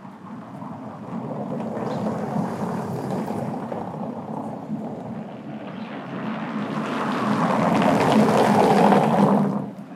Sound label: Philly Cobblestones